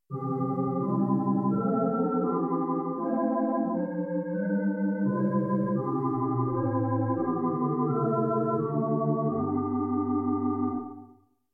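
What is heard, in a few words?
Tibia 8 tremolo, organ; Paramount Middletown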